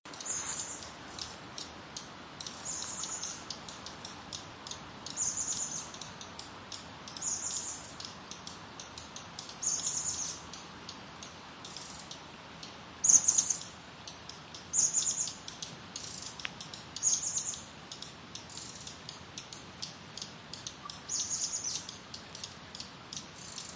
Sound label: alarms; Kramer Avenue